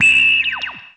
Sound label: synstb, Synth